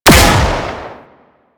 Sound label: Auto Turret Shot; Warframe; Venus Turrets